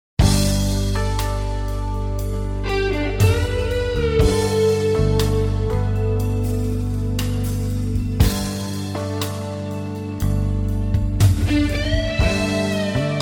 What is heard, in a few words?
Pop Ballad